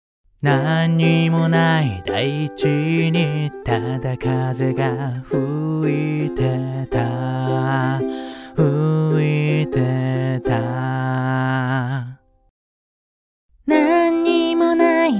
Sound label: U87Ai Vocal Vintech273 Gain4 Out2